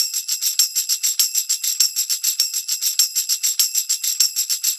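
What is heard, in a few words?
percussion, Roots Funk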